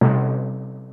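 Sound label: Timpani High, Yamaha DD-35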